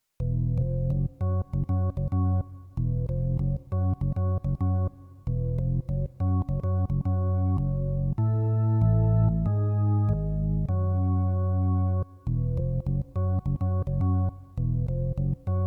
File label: hammond B3 organ